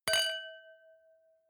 wine glass similar clink bounce; berufe; gastronomie; soundpool

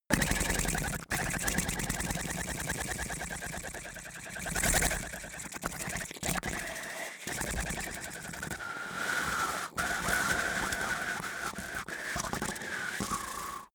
lips smacking long; comedy-cartoon; koerpergeraeusche

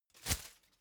whoosh paper scrap flutter; swooshes-swishes

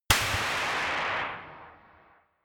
gun slapping pop gun ii

